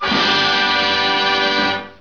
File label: GreetingCard